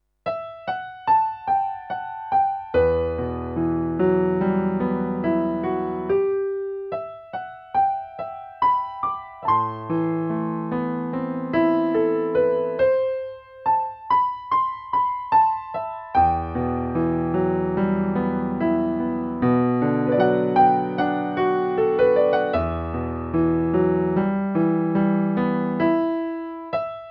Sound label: piano